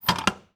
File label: Steel Door Handle; 99Sounds; The Warehouse